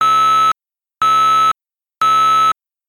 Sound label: drone